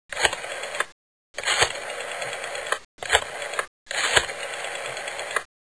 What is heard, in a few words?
rotary dial